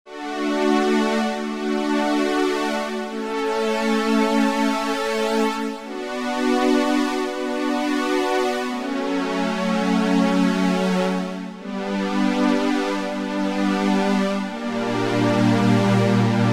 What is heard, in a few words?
Strings, Oberheim OB-8